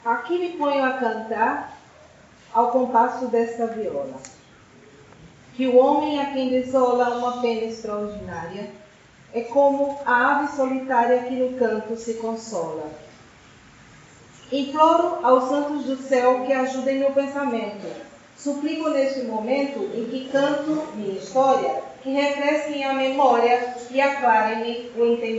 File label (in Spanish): Primer canto en portugués, Portugues